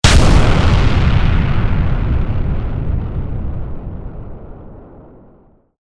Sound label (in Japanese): 爆発; モノラル; 武器効果音